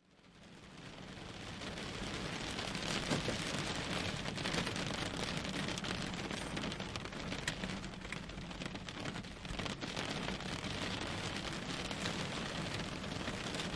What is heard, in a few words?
thunder